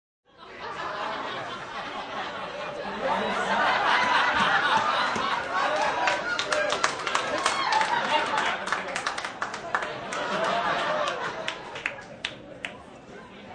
Group Laugh Large, Laughing live ambience, and clapping, applause, large group of 20-30; human voice; Laughter